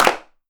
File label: clap one shot c key; percussion; single hits one shots